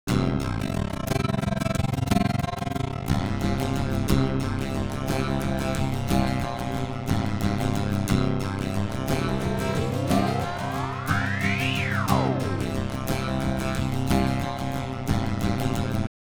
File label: ring mod